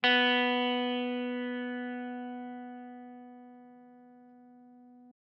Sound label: 20th pluck